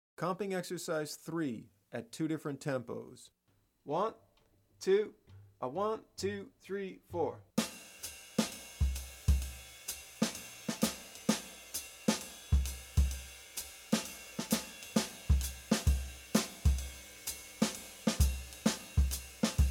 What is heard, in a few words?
Comp Ex. Slow, Bop Drumming; The Art Of Bop Drumming; Riley